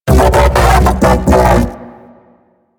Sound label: Mech Ambient Vocal; Warframe; DE; Vehicles